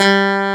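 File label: Clavinet hard G3; USB Soundscan vol.09; AKAI; Keyboards Old School; CLAVINET1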